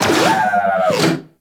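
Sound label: Research Facility Repair Robot Rotate; Warframe; Venus Open World; Objective Rooms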